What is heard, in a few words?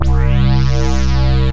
Blowout Bass Hit; Ableton; Factory Packs; Synth Essentials; Analog